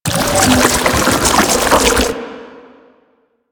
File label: Proto Infested Babau Tentacle Flail Loop, Enemies